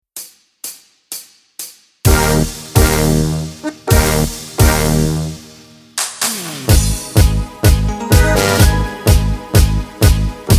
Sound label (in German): Lead mit Chor